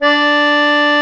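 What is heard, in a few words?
accordion D3 D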